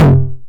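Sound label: 909 high tom tape saturation; Toms; Goldbaby Tape808 & Tape909; The Tape 909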